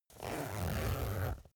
zipper use

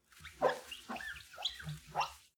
clean window paper towel close

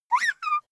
child laugh fake high

